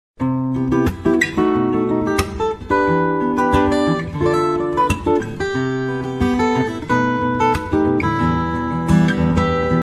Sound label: kitten playing guitar